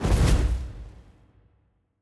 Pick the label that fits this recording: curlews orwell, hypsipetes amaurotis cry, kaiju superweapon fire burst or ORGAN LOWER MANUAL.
kaiju superweapon fire burst